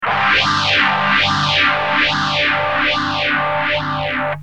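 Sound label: guitar DOD phasor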